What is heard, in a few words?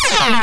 Gold Stolen; Mythos